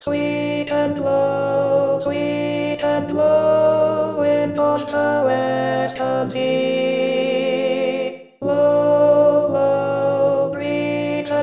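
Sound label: Sweet and low Alto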